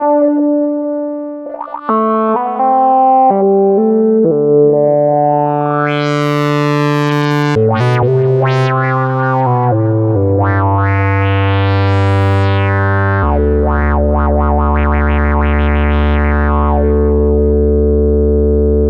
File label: Fresh Flutey, Synths; Sonic Foundry (Sony Creative Software); Modular Electronica